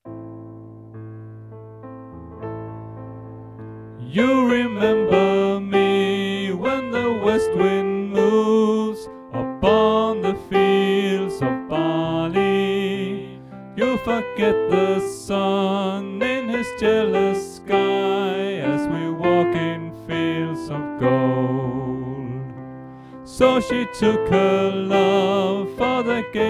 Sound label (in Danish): Sopran; oevefiler